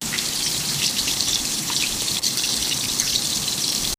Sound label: a sound of a fairy